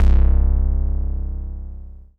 bass, Dance Mega Hip Hop Electro; EastWest

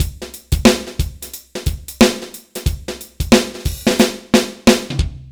bpm 90 amb

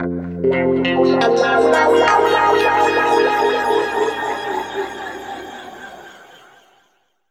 F P-S WA -L, Guitar; East West; Steve Stevens Guitar Samples Collection; PTCH-SHFT+WA

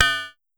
Pollard Perc, Syndrum

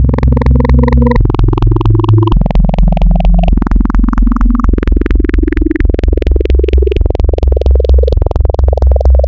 Spectral, wavetables; Architecture 32

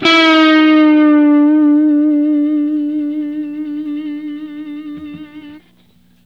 GTR SLIDE; Stereo Guitars; Hip Hop; E-MU Formula 4000 Series Vol. 1; Hip Hop Nation

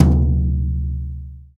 TOM XBRUSH, Brush Kit; Club-50 - Foundations Roland; Brush Kits

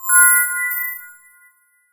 amb, Bell Atmos, Chillout; Best Service ProSamples vol.36

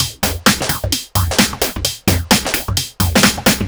130 Chicago Love No Bd, Drum Loops 130bpm; Zero-G; Soundclash Nu Dance Electro; Acidized Wavs

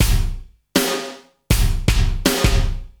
CB LOOP; Zero-G; Chemical Beats

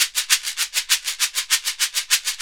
SHEKERE, Synclavier Percussion; ILIO

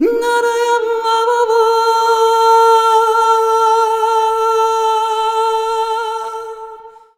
VOX, Balkanish Traditions

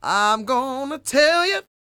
CROON, Vocal Blues & Soul MELLOW

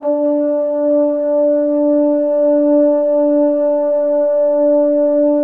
French Horns, D3; Best Service ProSamples vol.51 - Classic Orchestra 2

